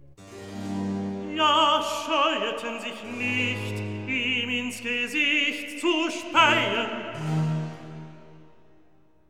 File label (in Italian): Recitativo; Ja, scheueten sich nicht; Handel; Brockes Passion